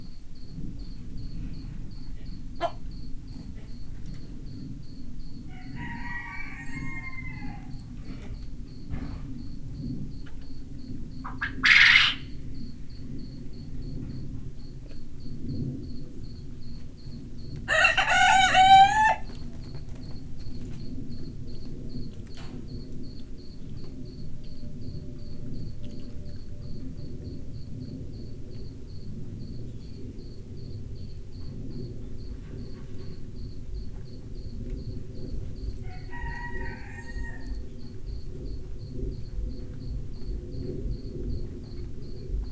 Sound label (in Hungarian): fürj póni kakas tücsök repülő; parasztudvar